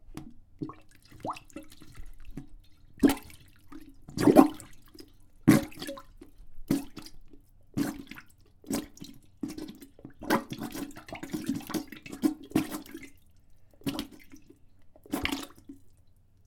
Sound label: Plunging Toilet